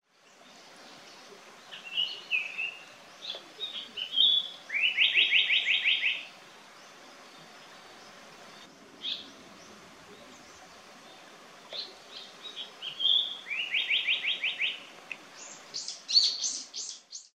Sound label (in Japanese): sankoutyou nakigoe